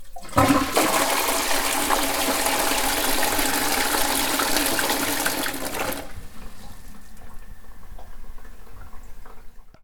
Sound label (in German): klo